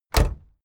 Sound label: car camaro close door